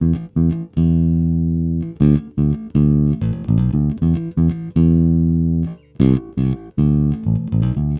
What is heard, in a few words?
120 Pop 70s Bass Emaj; Pop Rock; E-Bass; Pro Tools Essential Loops; Digidesign